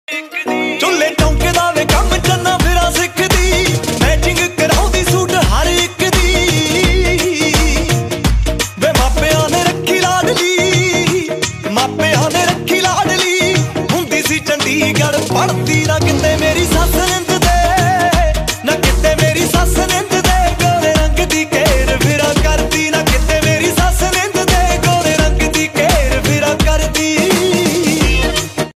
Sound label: Punjabi